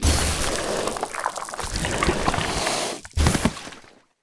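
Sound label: named death; Furustar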